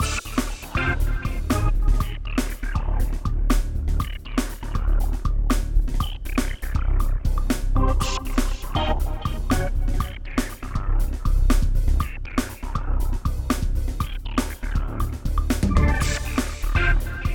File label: monster factory looping; "The Monster Factory"